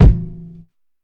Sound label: bassy kick drum single shot a key